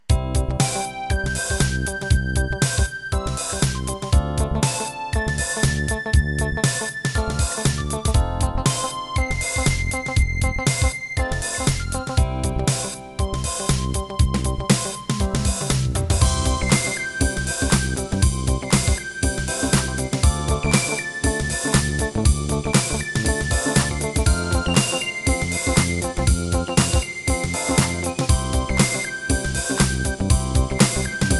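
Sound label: Hip Hop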